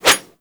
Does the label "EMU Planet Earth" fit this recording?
No